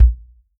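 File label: bass drum, Real Drum Kit; Best Service ProSamples vol.43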